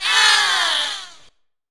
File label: practice Aww; HeavenStudioPlus; fireworks